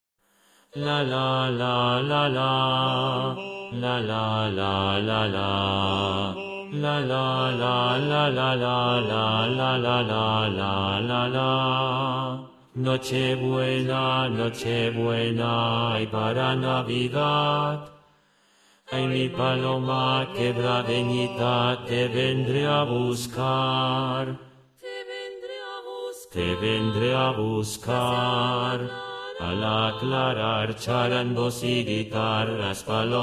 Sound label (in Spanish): tenor